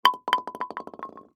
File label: Bowling Pin